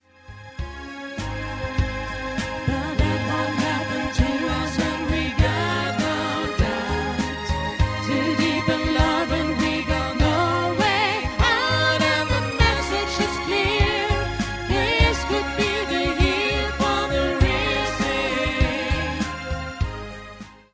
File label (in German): Duett